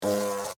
Hornet Land Short, Animal, Hornet, Land, Short, Fly, Wings, Buzz, Insect, Bug